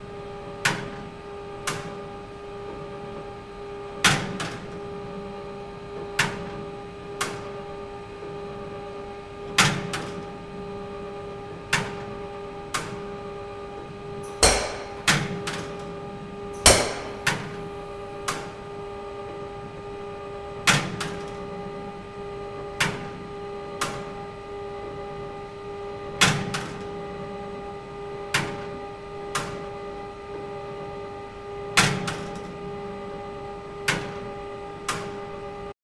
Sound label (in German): Fabrik, industrielle Baustellen Schallwellen